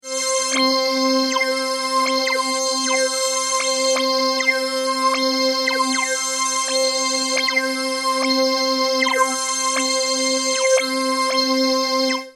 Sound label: Mutron biphase